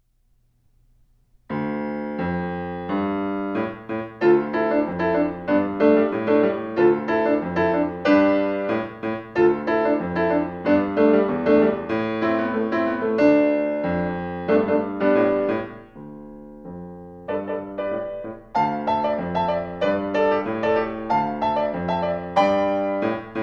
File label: Jazzy; Modes and Moods; Vandall